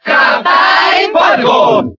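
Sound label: Cheer Spanish, crowd; Captain Falcon; SSBB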